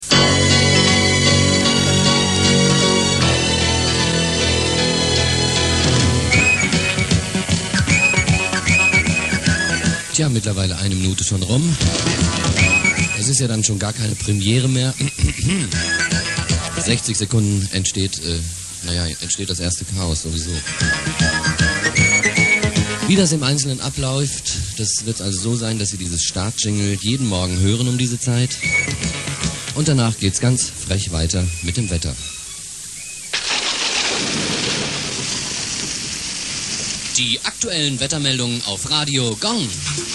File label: gong; Gong-Sendestart; München